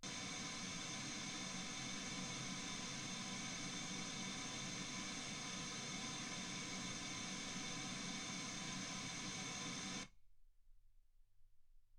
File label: wgn 48kHz